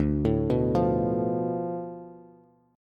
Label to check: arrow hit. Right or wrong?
Wrong